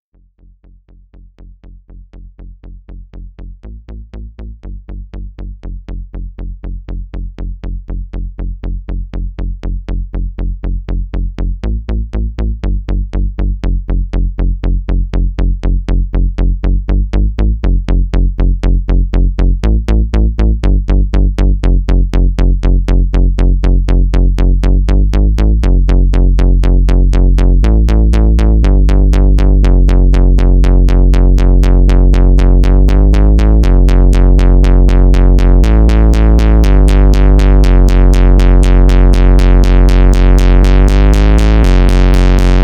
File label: bass